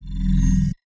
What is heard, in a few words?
Matrix Style Morphing Transition